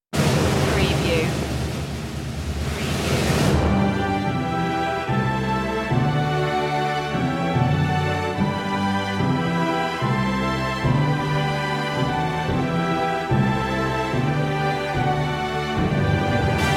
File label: god save the queen no fanfare no choir